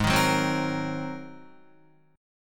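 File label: A flat 9th A flat Guitar, chord; Ab9 strummed; JGuitar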